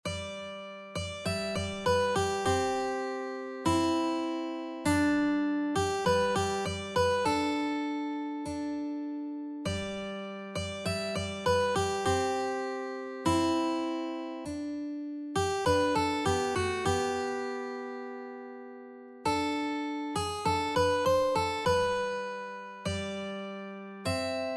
what a friend, mandolin

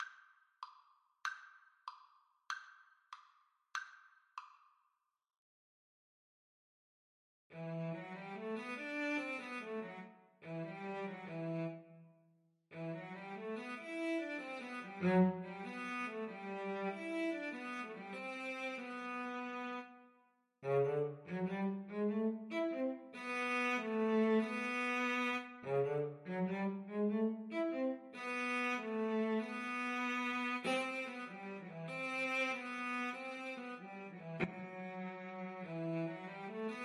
Cello Duet

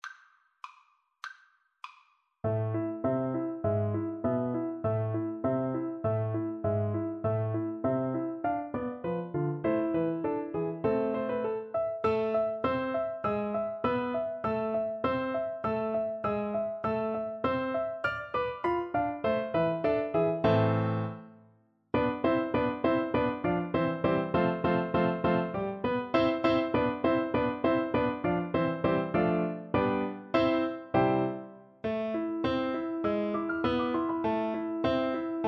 tambourin violin; gretry

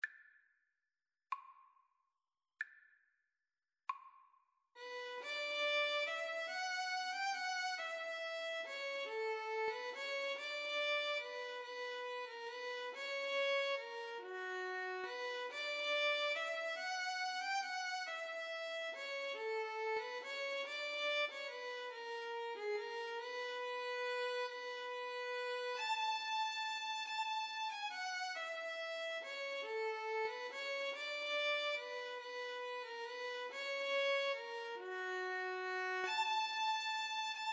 violin cello, Violin-Cello Duet

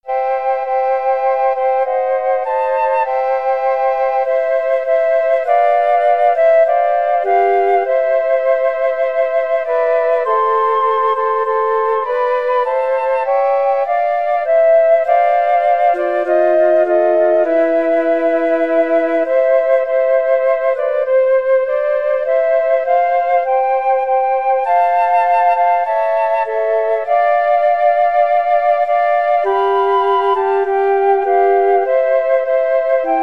beneath the cross fl trio

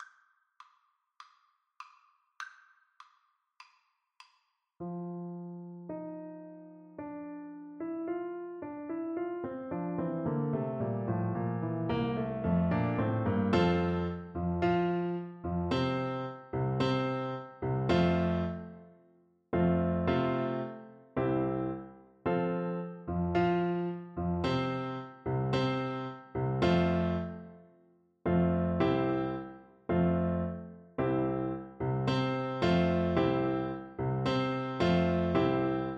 piano duet

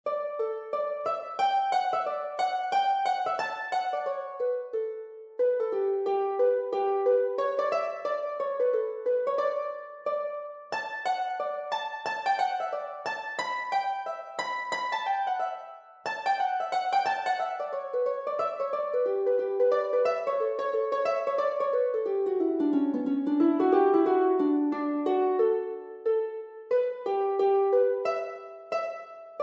harp

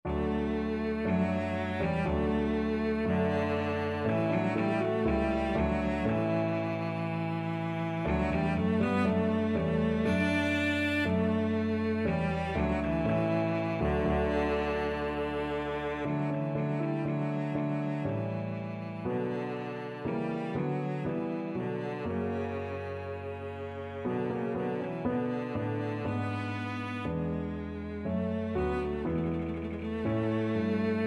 thine be, cello

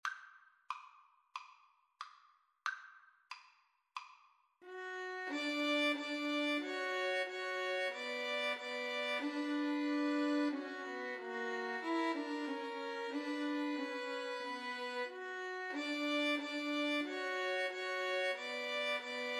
Violin Trio

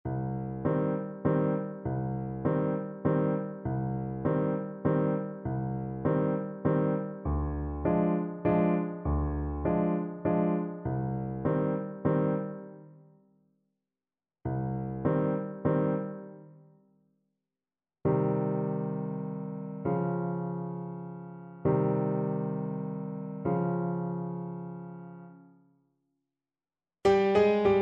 creak crunch scream, Saxophone

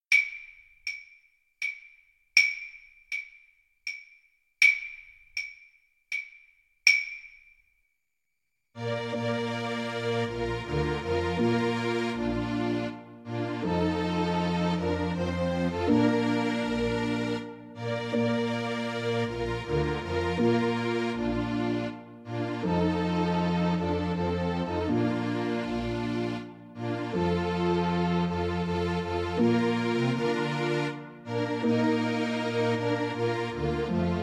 Clarinet

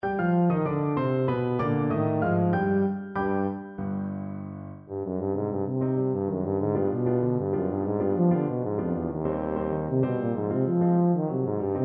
tuba

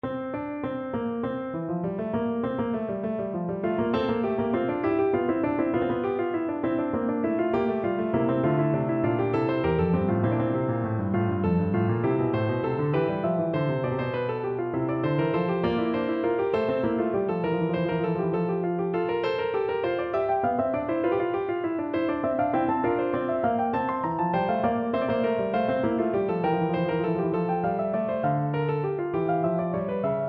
fugue, piano; bach; bwv 856

